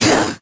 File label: melee; Crypt of the NecroDancer